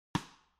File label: snare sidestick; Snare Drum: Sidestick